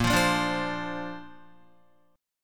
A sharp Major Add 9th, Guitar; strummed

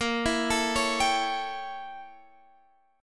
A 7th A sharp, chord; A7/A#; arpeggiated; JGuitar

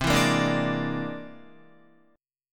C Augmented 9th, Guitar; strummed; JGuitar

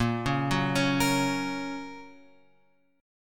A sharp Minor Sharp 5th; A#m#5 Chord {6 4 4 6 X 6} arpeggiated

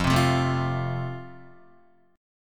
F Major, Guitar; strummed

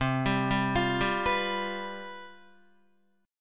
C Major 7th Suspended 4th, down, Guitar; arpeggiated

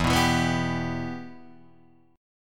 E Minor E, Guitar, DADGAD; 2,2,2,4,2,5

